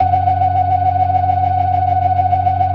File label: FAST VIB, TREMELO+VIB, Synth and Organ